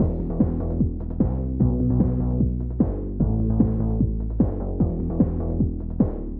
Dance Club (Distant)

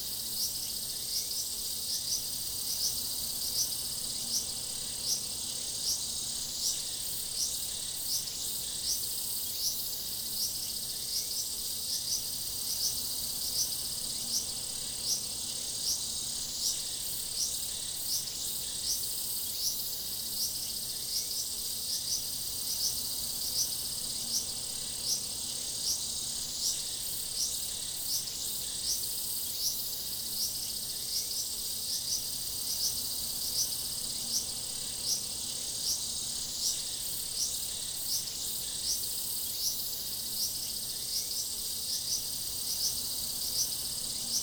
cicadas

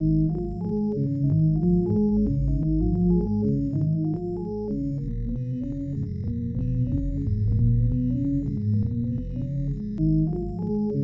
Music

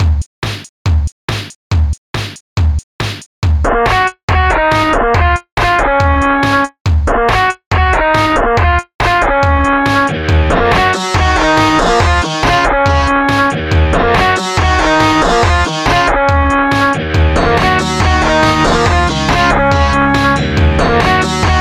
Beat

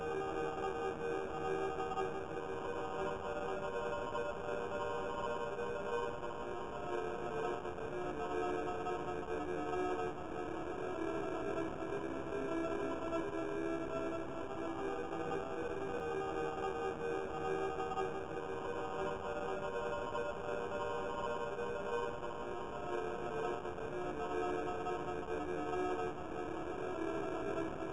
empty choir slowed

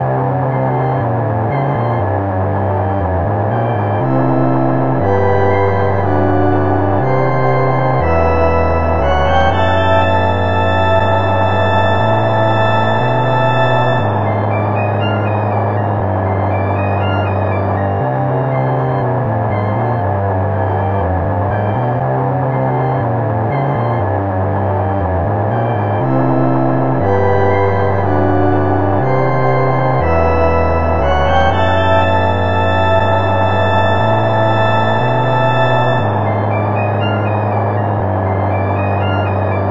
stab

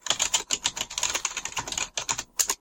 Key type

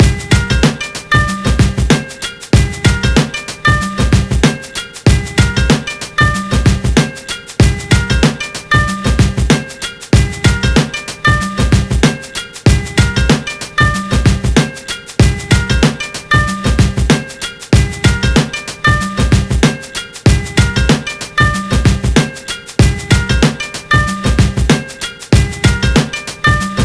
valiha beat

